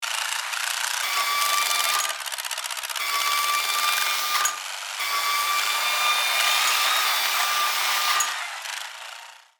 RC excavator toy digging; rc toys

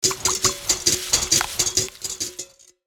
Steel whisk stirring mixture; cooking

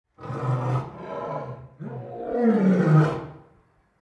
Lion roar strained and long

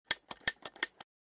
Gas stove turn on gas clicks ignition; cooking

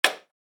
Electric fan plastic button switch on; appliances

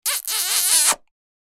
Comedy kiss goofy smooch; shorts sound effects

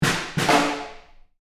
Marching field drum accent

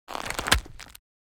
Crab claw crunch; Fish and Aquatic

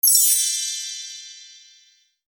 Fairy magic touch

